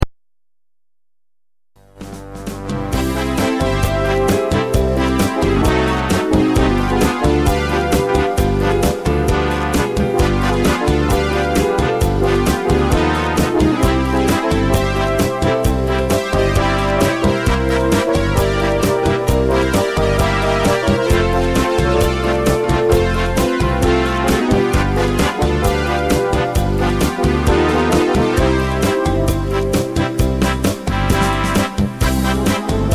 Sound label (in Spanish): chachacha cubana; karibik